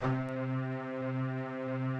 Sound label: Instrument